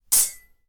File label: Saber Clang